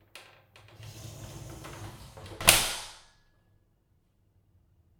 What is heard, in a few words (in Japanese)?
風呂ドア閉める音